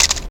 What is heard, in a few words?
Camera shot